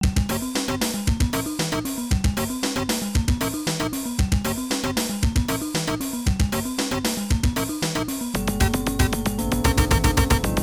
Warning; Avenging Spirit; arcade

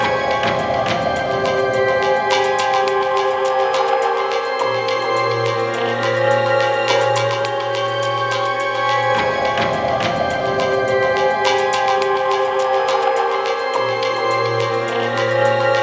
BGM Movie Mysterious

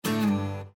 Critical Stop; Windows 7

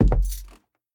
Footsteps Wood Walk; SFX; The Town

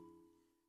Loop Tail; Good Job

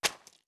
Impact Mud Wet Light

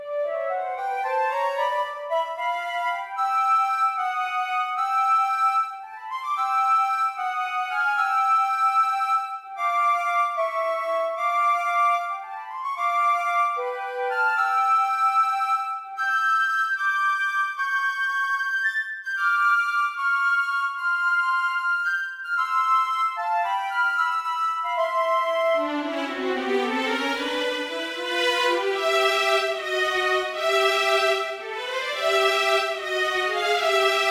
Underwater; Super Mario Bros.; Super Mario Maker

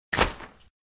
Propeller hat Rainy Off

